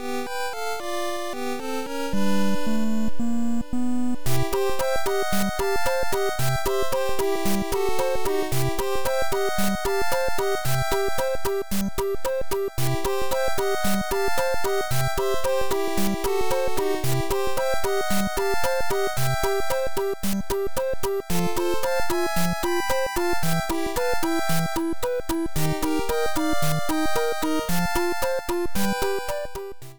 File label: Underwater Theme; Super Mario Bros 3